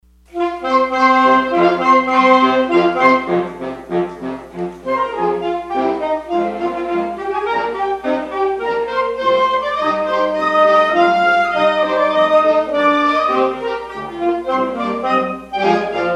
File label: english folk song suite